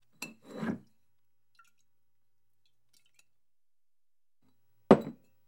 Bottle Up And Down On Table; Grab Bottle From Table With Light Liquid Slosh And Set Down. - Bottle Set Down